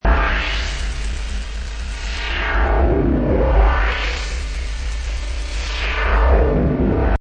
Horr Atmo Pan, Atmospheric horror loop at 135 bpm.; Horror Pan Atmosphere Loop; Synth Loops